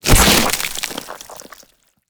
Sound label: GORE - Head Explode; SoundMorph